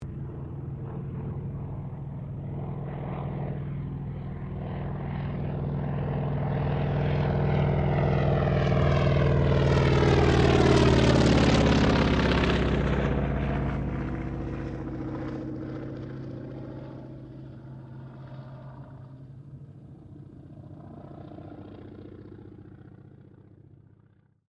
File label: AIRCRAFT CA-28 CERES PASS; Airplane; Airplanes; Vehicles